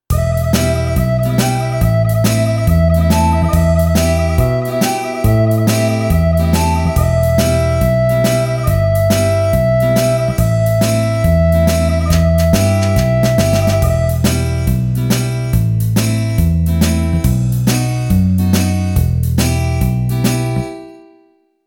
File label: Rock Rhythm in Em 140; Jingle Bells